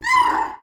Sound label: Seedling Death